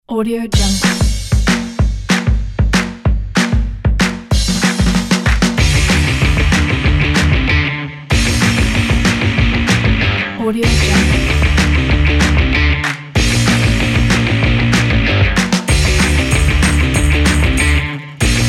girls play punk rock

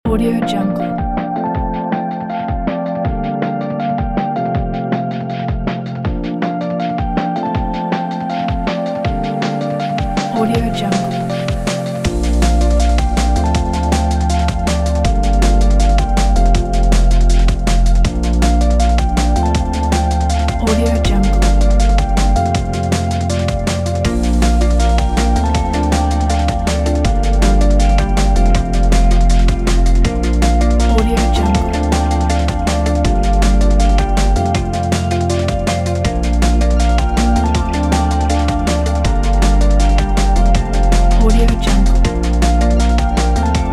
summer inspiration drum and bass

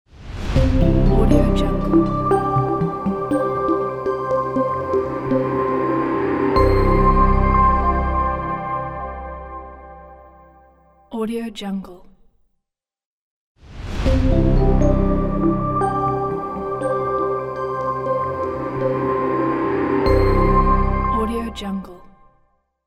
elegant ambient logo

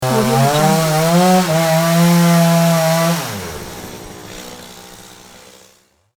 chainsaw

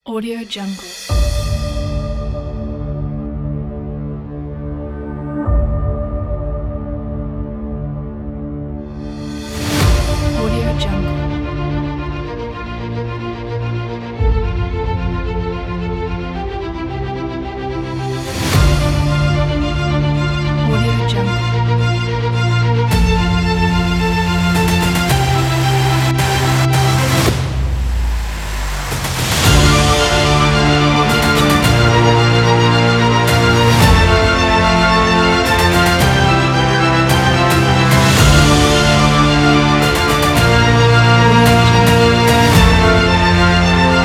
cinematic trailer

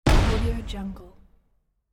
smoke bomb

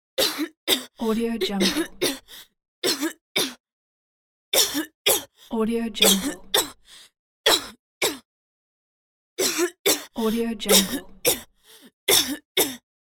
teen girl coughing

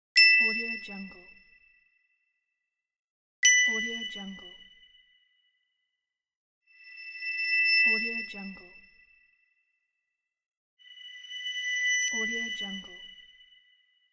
gold shine ding

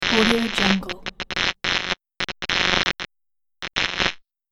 neon sign flickering

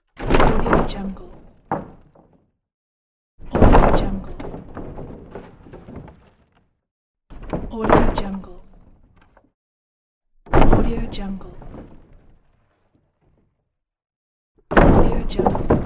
falling lumber

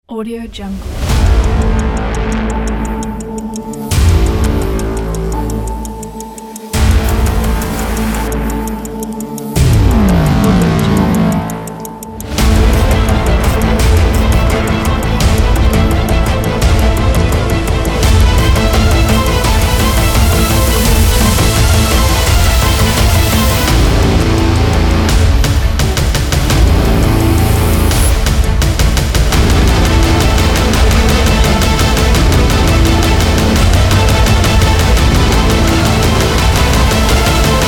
countdown trailer

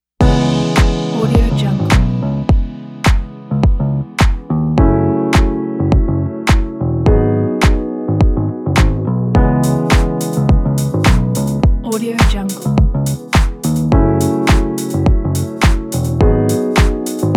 atlantic lounge

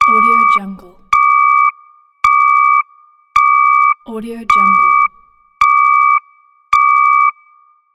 alarm sound

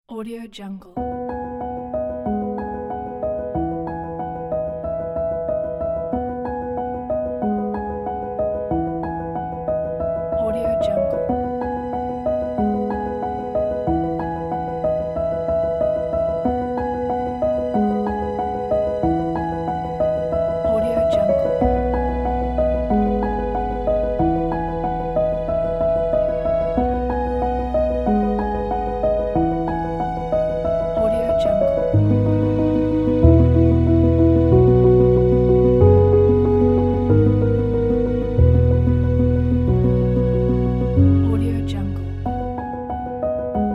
simple light piano

